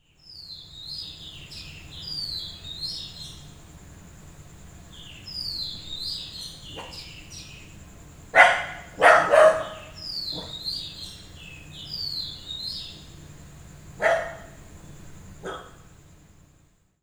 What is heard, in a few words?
WHISTLING, Indian Traditions; USB Soundscan vol.45 - Indian Traditions; AKAI; AMBIENCE2